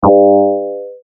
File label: Beep Tone